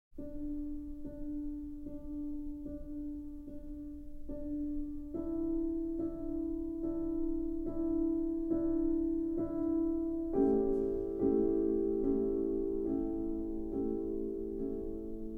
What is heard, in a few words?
Concerto in D Minor, Adagio; BWV 974